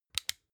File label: flashlight on fast